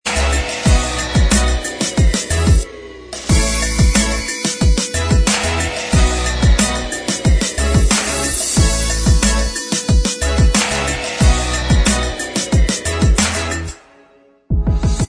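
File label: instrumental track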